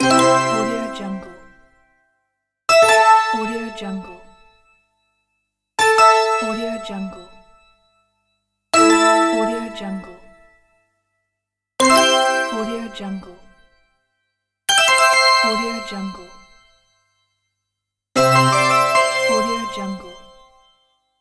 buttons sound

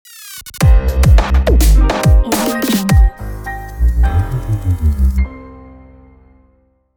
dub piano logo; andrey-bogdanov

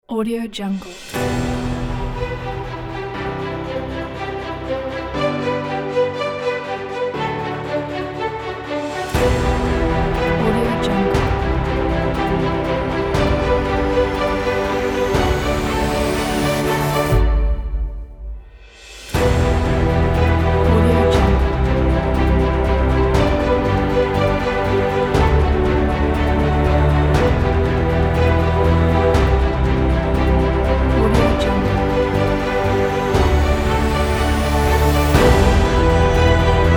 epic

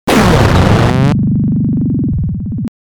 retro doom lose

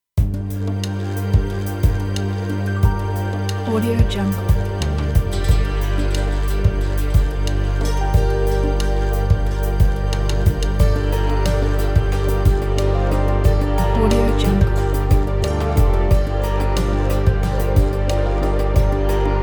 ambient asian mystery

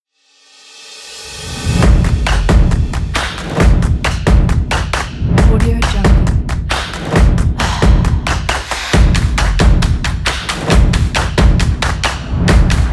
action sport upbeat percussion

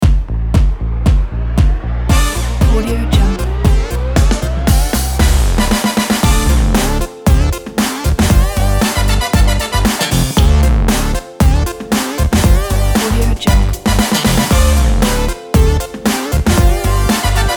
downtown funk

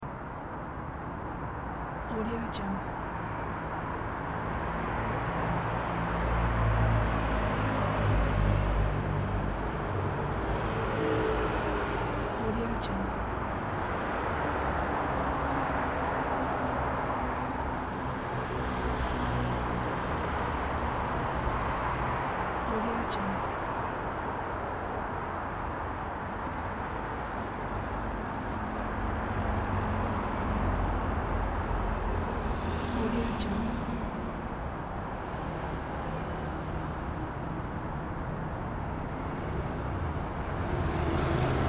highway

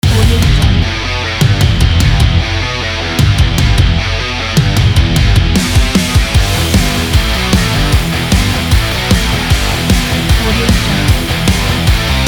hard rock loop

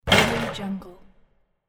basketball rim shot

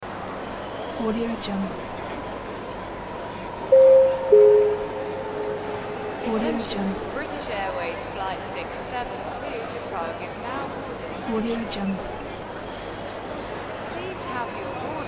airport ambience

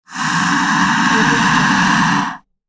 cobra indian spits venom; hollywoodedge

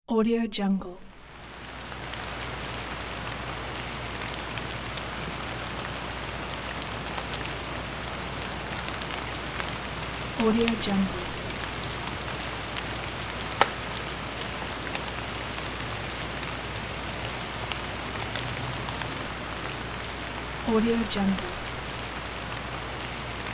medium rain

